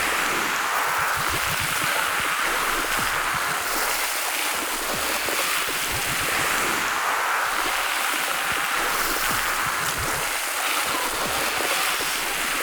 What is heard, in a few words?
Venomous Loop; Warframe; Eximus